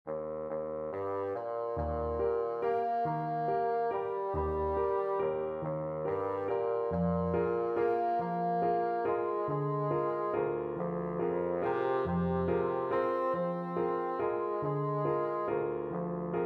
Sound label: blue danube, bassoon